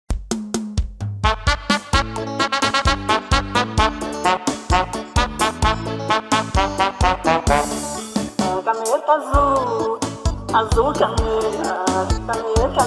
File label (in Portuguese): VERSÃO ARROCHA